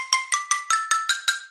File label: honor 8s sms ringtone